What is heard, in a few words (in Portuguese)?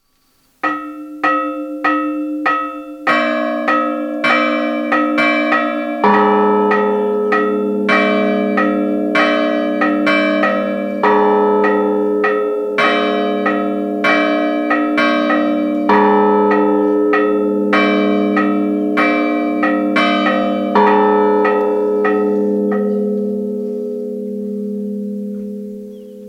Toque Fúnebre Igreja; Os Toques e o ofício do Sineiro; Igreja N. Sra. do Pilar Pirenópolis